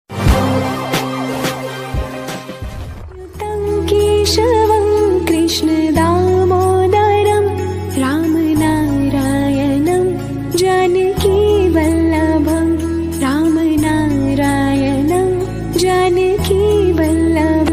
lord krishna mobile ringtone lord krishna flute ringtone